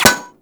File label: Bullet Impact Metal